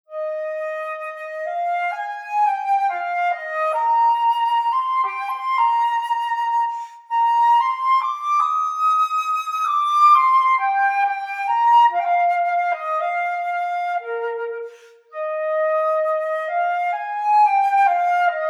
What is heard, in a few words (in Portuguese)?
flauta; Flauta Transversal; Conhecendo Instrumentos Musicais